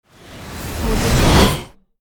high tech rise whoosh

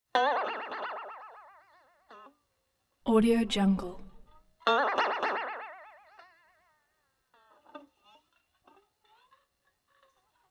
boings comic wobbly wavering tone bouncy buzzy

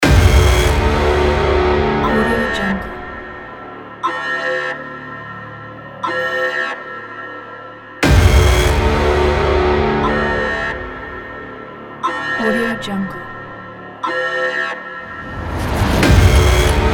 action horror countdown

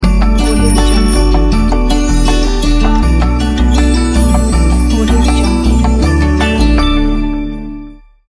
new year logo; charismasound

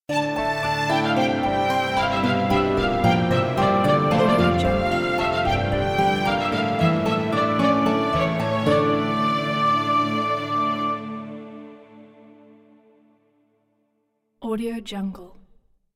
medieval adventure logo

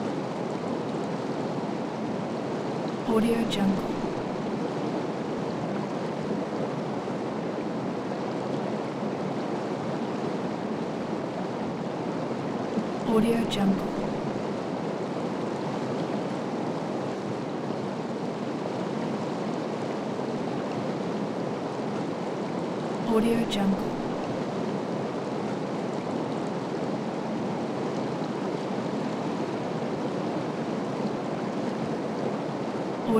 rain on water; prosoundfx

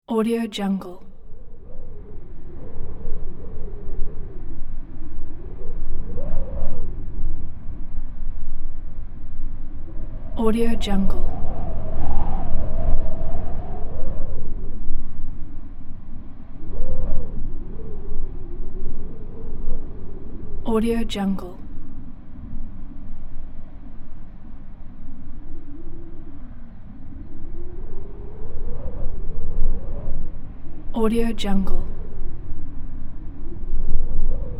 wind

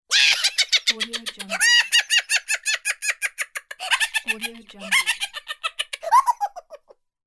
long cartoon laugh

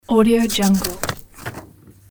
page flip

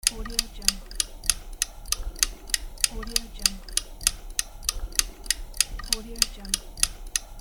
steampunk clock

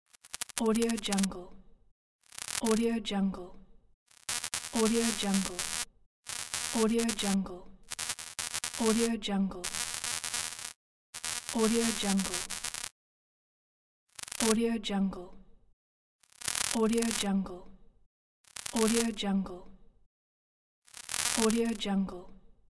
digital sound effect pack; teldrassil

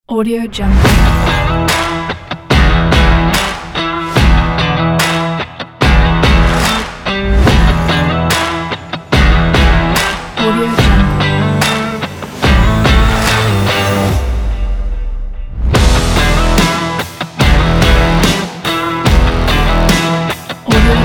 sport rock trailer intro

